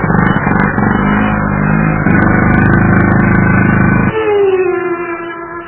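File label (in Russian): звук барахлящего двигателя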